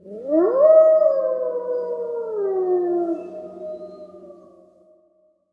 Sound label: wolf; dota; ambient; soundscapes; diretide